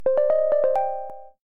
Chime; NFL

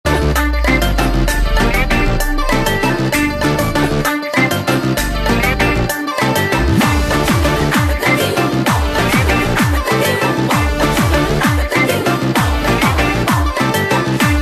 Kuthu Beat

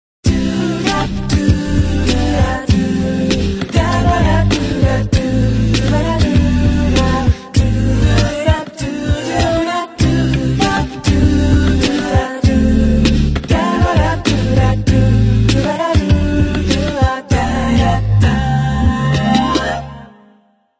Nokia Tender

